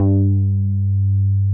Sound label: JX round G3, Drums; Drums In Your FACE!